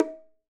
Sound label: bongos Single Hit Right Hand; raw perc one shots; SEISMIC Drum Sample Pack; Slate